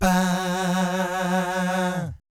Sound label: VIB FX, Vocal Planet Jazz & FX; ILIO; JAZZCOMBO